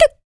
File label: HIT, Jazz FX FEM PERC